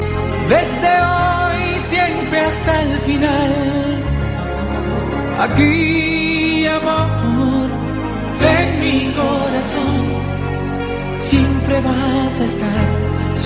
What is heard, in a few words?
reggaeto